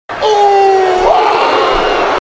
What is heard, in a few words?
Yell; Roman Reigns